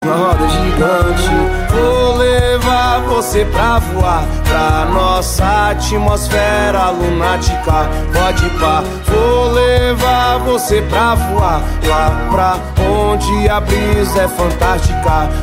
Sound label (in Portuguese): Atmosfera Hungria Hip Hop